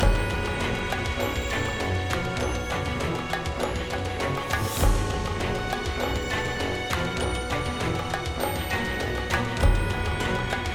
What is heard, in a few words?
battle bgm; 音效